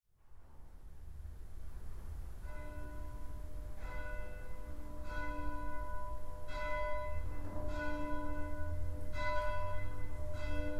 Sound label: bell